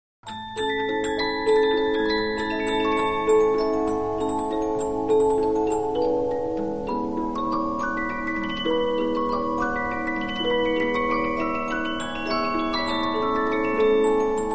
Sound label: Polyphon, 15inch disc musicbox